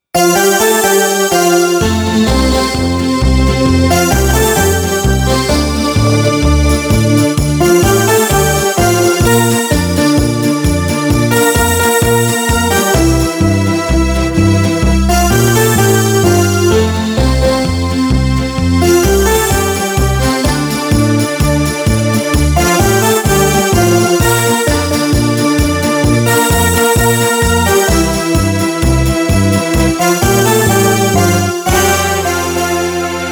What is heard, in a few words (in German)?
Disco Schlager